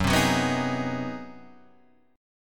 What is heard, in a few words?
G sharp 7th Sharp 9th